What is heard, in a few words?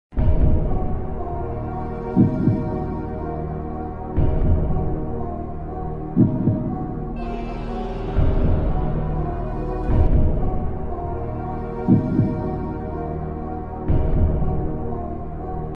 detective music